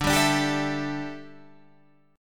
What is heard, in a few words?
D Suspended 2nd Suspended 4th